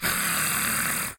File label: Goblin Hiss; SyrinScape